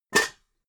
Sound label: pots stack